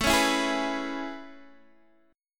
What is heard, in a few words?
A Major 9th A, Guitar, DADGAD